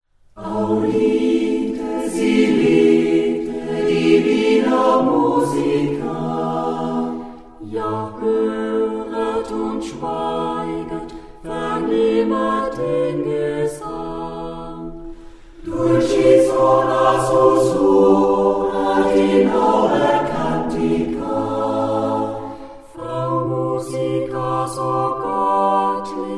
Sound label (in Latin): audite silete; saengerrunde poellau